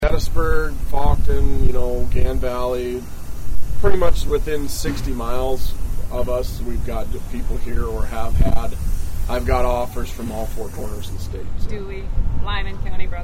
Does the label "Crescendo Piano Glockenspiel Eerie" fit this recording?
No